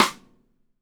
SONOR

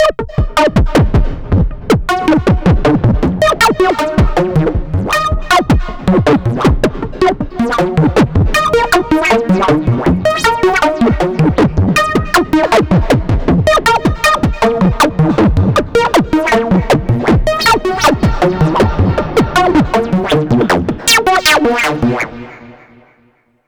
Even Tine; KJ Sawka; Drum n Bass & Beyond

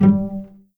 G2 PIZ CEL L, VCS PIZZ

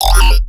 bass hit interface F; Virtual Riot

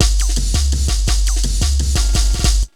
Essential beats

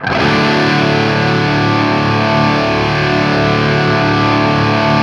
open D loop, guitar; East West; Steve Stevens Guitar Samples Collection; OP CHRD LOOP

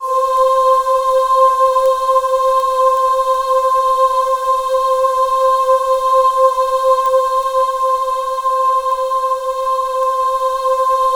C4, Synth Leads

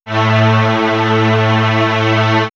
Swirls String Vox, pads; X-Static Goldmine

